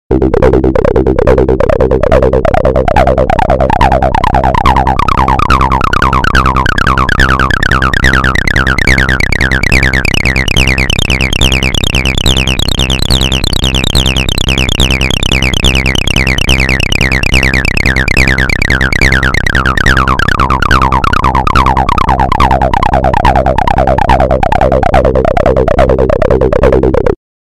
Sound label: Techno Trance Ringtone